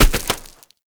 Bird Of Prey Bodyfall Dirt; Hunting Critters

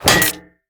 Idle Head Impact; Warframe; Fortuna; Zuud

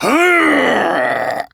Laugh; Kuva Lich; Persona D; Ability Lift

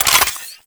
Modular Secondary Handle Slow Reload, Guns; Warframe; SU Modular Guns; Reloads

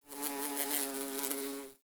Bee; Warframe; Ambience; Nineteen Ninety Nine

